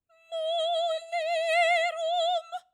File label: choir; too slow